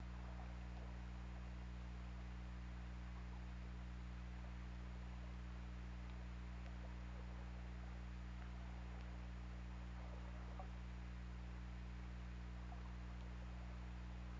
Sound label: Orca; OrcaLab